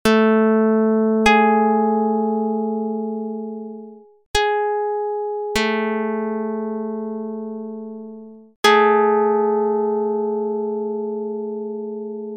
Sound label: pluck dr220; Jid 19/10